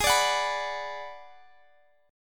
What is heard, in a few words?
B Minor Ab; strummed